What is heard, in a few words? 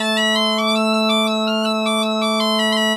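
bagpipe 160, Celtic Flavours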